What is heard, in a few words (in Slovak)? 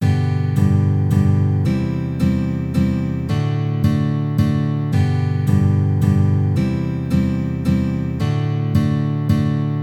nacvik zakl akorov kadenci v rytmoch G dur valcik